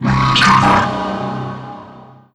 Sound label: strider skewer talk; Strider Remake